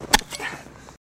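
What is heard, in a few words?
Dig Single Exterior Offset, shovel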